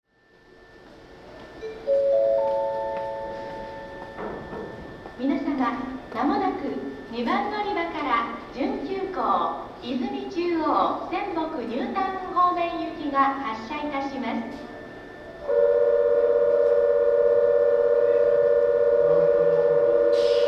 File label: bell, station; nankai; namba